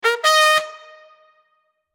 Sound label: victory horn